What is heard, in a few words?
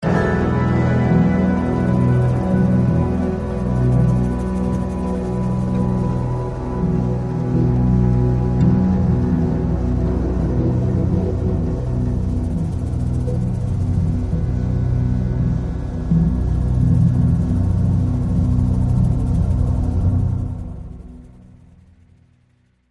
Loop Suspense